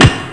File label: cbar hit, weapons mace